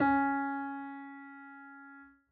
piano c#; Golden Crayon Sounds

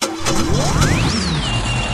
tank start ext; Original TK Sounds

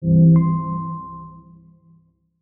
announce messages tone carplay; iOS 26 Sounds

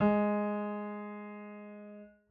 piano g#; Golden Crayon Sounds

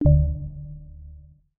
Device Setup; iOS 26 Sounds

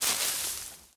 leaves step; Golden Crayon Sounds

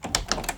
door open; Golden Crayon Sounds

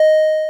elevator beep; Golden Crayon Sounds

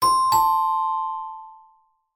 doorbell; Golden Crayon Sounds

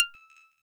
Scroll Page; Schemes for iOS; ESAN VO Sound Schemes; ESAN Business SSound Pack

